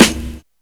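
present acoustic snare sample a key, tuned to the A note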